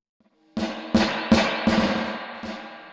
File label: Spring INTENSITY 100 SPEED 0.5Hz